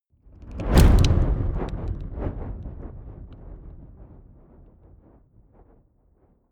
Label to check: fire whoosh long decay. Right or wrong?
Right